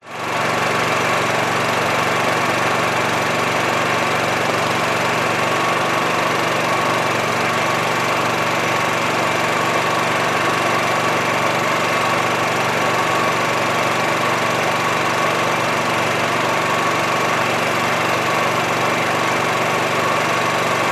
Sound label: Power Generator Gas Exhaust